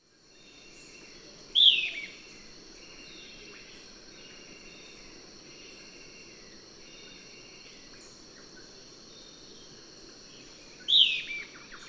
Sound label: Lathrotriccus euleri, song; S. America; Eastern Lowlands